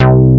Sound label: Moog Bass Hit